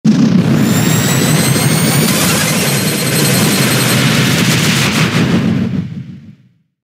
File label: Spermbeam Cannon; The sound effect from the move ''Hyper Beam'' as featured in ''Pokémon X & Y.''